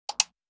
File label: click single